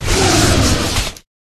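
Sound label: Dragon Skeleton buff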